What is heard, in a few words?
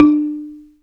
ZYLIMBA; Greytsounds VOL-1